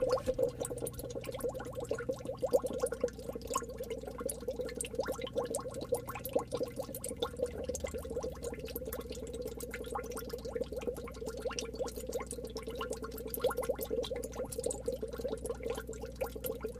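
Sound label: Aquarium Small Air Filter, bubbles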